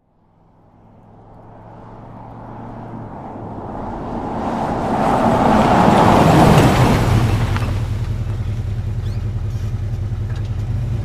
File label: Wagoneer: Fast In, Idle And Off, Jeep Wagoneer